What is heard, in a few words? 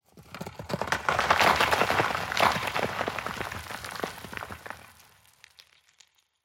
tenement rockfall; ambient, rocks